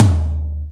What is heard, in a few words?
Yamaha EX-5